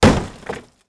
Hit Wood; GrandFantasia

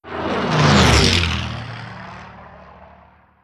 alert air; Hearts of Iron IV Death or Dishonor

